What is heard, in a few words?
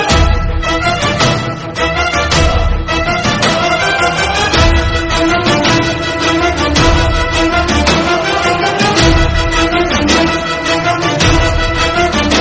Theme Music Bgm